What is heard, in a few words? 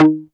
TEARDRP1 D#3, Yamaha VP-1; Music Store